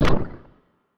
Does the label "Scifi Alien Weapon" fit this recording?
Yes